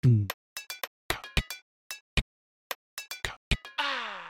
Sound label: Barock Loop